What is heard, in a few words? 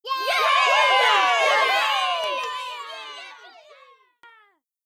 cheer